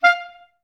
STAC F4, Orchestra; Best Service; Peter Siedlaczek's Advanced Orchestra

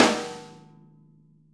VINT KITS; Sonic Reality - Vintage Timetraveler

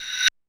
Dance Kit, Korg 01W

